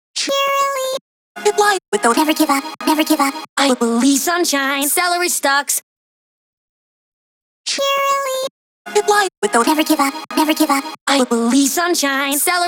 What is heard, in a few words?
vocals dry; 128BPM; Pony Fan Music Remix Stems